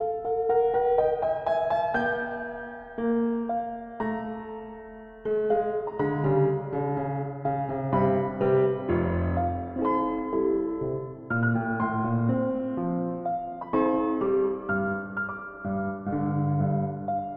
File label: Quarter-tone Piano; Quarter-tone Piano Prelude No. 6